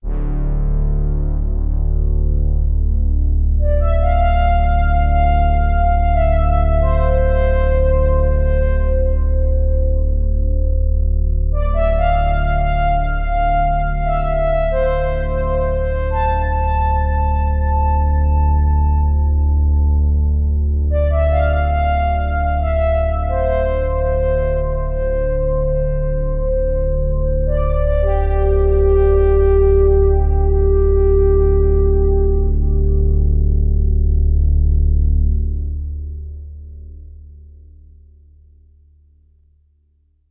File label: Nina Soft and Warm Pad; Melbourne